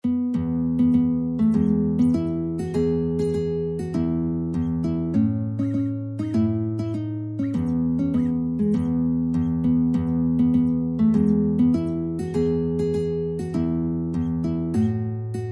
battle hymn guitar

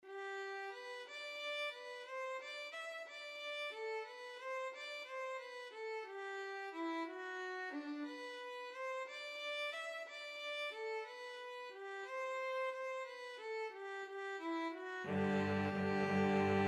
quem, string trio

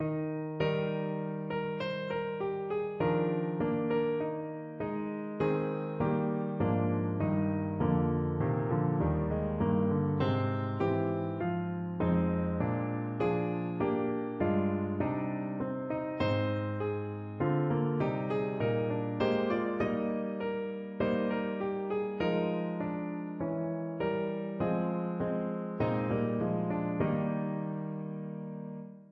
piano

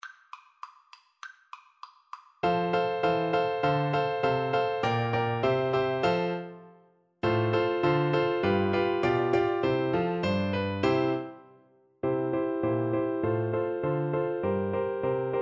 handel queen of sheeba, Bassoon

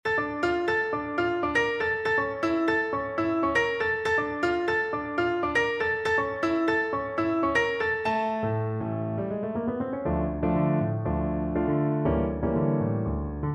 hot sauce salsa FL kar, Flute

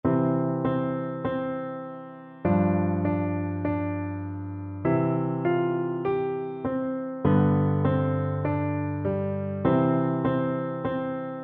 sur la pont, piano duet

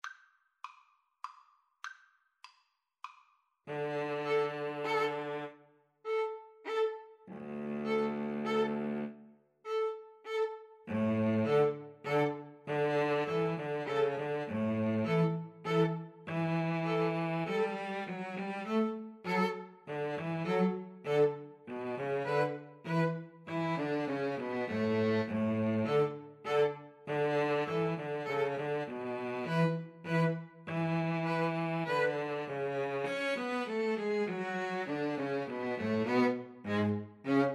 2 Violins Cello; saint saens elephant

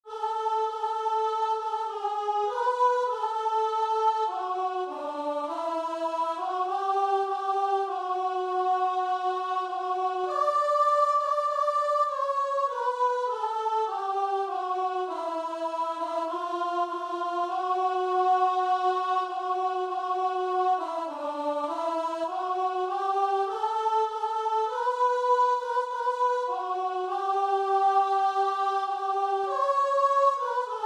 beneath the cross, guitar and vocal

